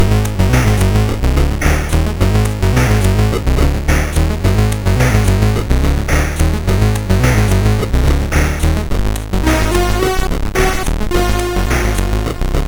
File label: Mini Melodies, SID MOS6581R3